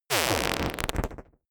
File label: choppy noise short, sciene-fiction